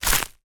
foley footstep gravel dirt dried leaves

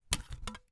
household dvd discs clash

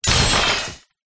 impact crash metal and glass hard

